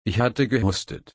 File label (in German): husten